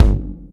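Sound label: bass drum, right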